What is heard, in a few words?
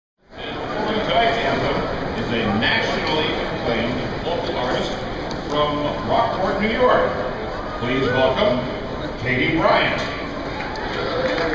national anthem fenway park; red sox